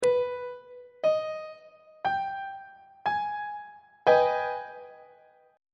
a flat minor major 7th 1st inversion on treble clef